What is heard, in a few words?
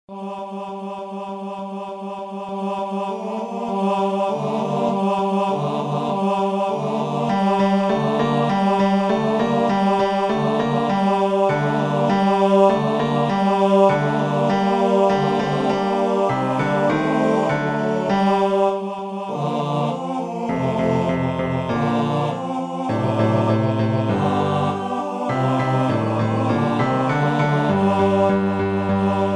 BASSES